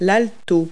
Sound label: viola; Multi-Lingual Talking Picture Dictionary